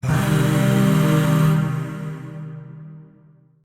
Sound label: Shawzin Pent Min Chord D; Warframe; Zariman; Void Shawzin; Chords